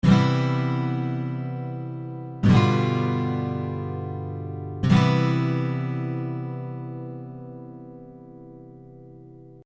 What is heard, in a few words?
Gt CGC 7th